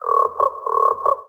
Frog; Env Fauna

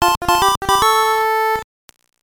Stage Fanfare

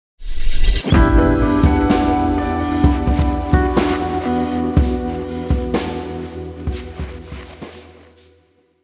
Mission Clear Sting; Eternights

